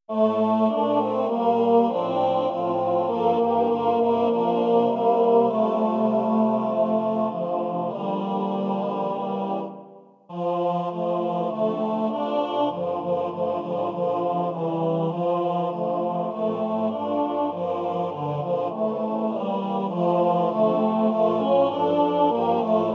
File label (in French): Tenors Bas